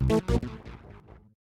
Reward Mid; Lethal Company

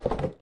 Grab Cardboard Box

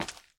Gravel Wet

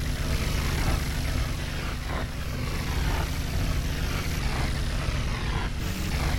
shock beam; Lethal Company

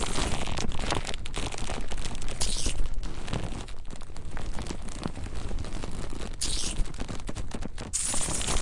Cling To Player; Lethal Company

